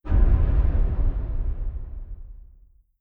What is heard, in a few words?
distance perdition beam fire; apocalypse